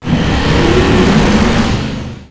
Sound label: BIKE ACCEL